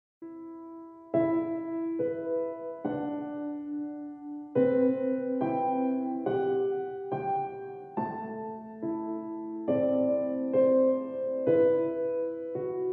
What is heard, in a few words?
Piano